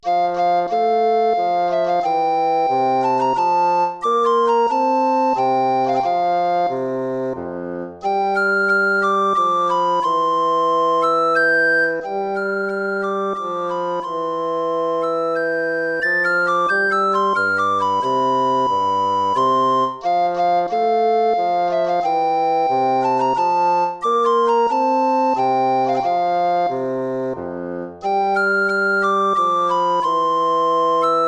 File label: minuet; mozart wolfgang amadeus